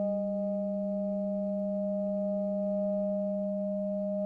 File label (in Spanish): Multiarmonicos